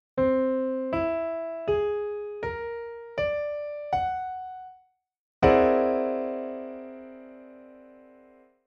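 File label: chord piano aug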